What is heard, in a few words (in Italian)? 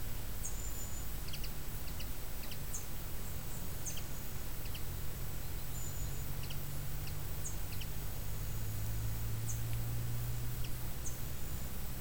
verso canto fanello carduelis cannabina eurasian linnet bluthanfling pardillo comun; bioacustica